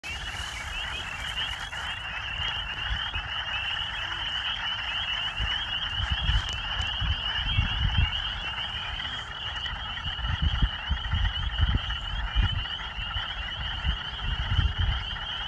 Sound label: Frog call